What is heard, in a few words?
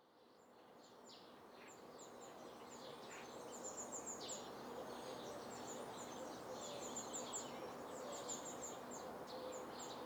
Bird Songs Bird Sounds